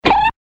Poke

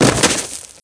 Body Impact; Physics

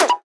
Pop Up; Ed Edd n Eddy; Adromulus Pack; EEnE

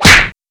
Punch

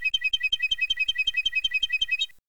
waving straw long; Ed Edd n Eddy; Adromulus Pack; EEnE

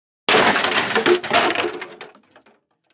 Junk Crash

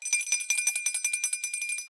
Bell Ring; Ed Edd n Eddy; Adromulus Pack; EEnE

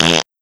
Fart

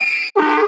donkey; Ed Edd n Eddy; Adromulus Pack; EEnE

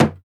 Knock; Ed Edd n Eddy; Adromulus Pack; EEnE

